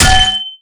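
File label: bottle hit robot; melee impacts; Garry's Mod; tf2; mvm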